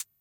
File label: Cabasa; classic machines